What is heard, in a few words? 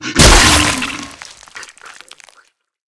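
sharp loud sound of splashing water from a bucket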